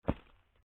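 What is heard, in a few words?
lgt Step mono, light step; torque3d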